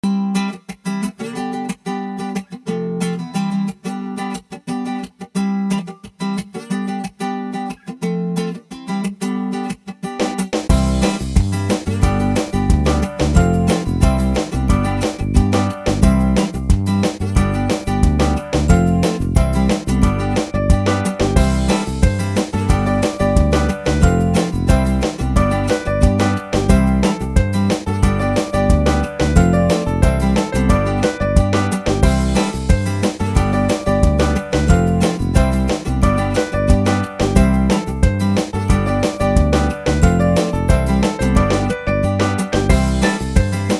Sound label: TV Commercial